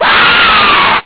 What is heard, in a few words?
ghost